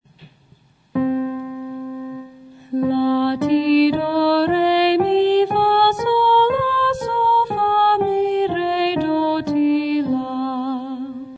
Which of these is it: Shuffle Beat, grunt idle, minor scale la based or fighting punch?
minor scale la based